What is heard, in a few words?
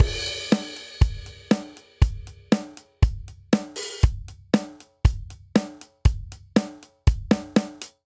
Classic Rock Verse 119 bpm, Drum; 4 4; MIDI Clips; Ableton; Factory Packs; Drum Booth